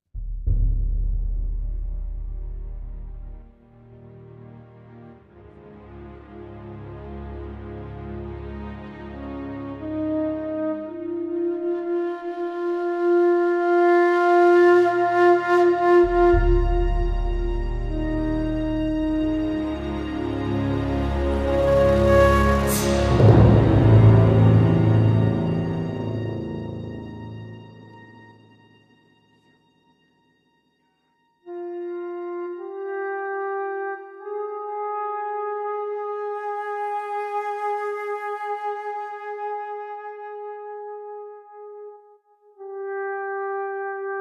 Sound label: orch drama flute